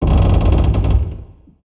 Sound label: Amb Constructions; Red Orchestra Ostfront 41-45